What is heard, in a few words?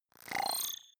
bubble fizz appear, swooshes swishes